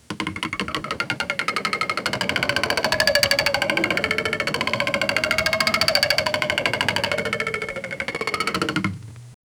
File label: extremely fast continuous, wooden